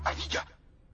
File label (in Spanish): ardilla